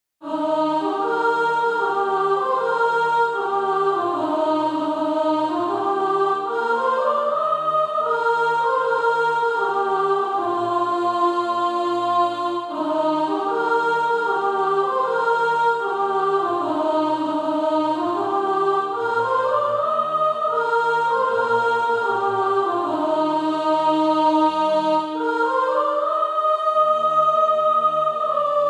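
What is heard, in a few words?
soprano, SATB Practise Music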